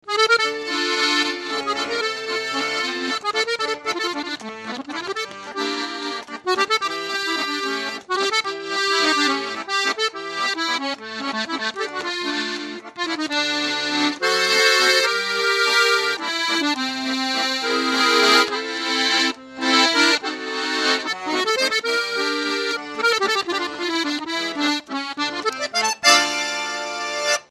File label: Dallape 140, accordion